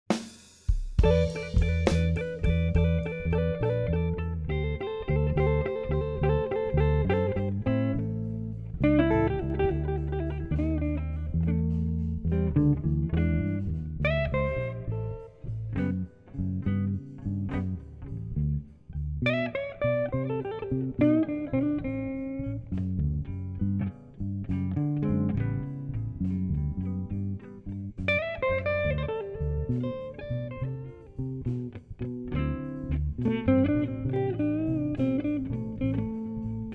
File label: Chicago Westside slow blues play along